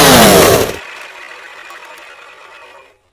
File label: chainsaw wind down; trespasser v2